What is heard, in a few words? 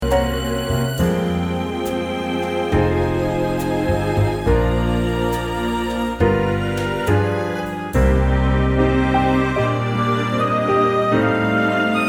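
backing track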